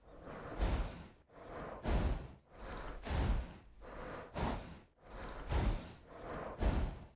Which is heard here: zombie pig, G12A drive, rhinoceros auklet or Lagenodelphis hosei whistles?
zombie pig